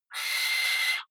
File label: owl shriek